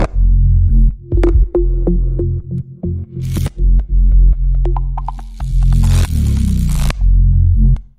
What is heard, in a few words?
bass; signal